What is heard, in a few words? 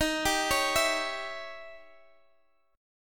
Eb 7th Flat 9th, Eb, Guitar, Drop D; x,x,13,12,14,12